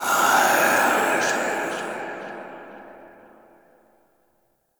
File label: Ghost Death, Vocals; Enemy; Nightfold Test Range Ghosts